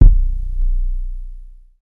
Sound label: roomy kick drum one shot b key, kick drum sample tuned to the B note; kick drum samples